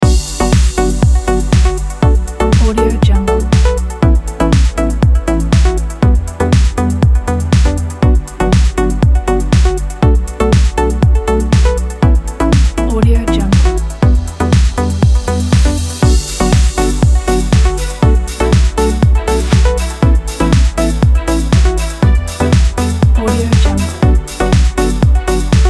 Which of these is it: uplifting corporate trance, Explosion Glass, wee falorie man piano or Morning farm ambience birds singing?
uplifting corporate trance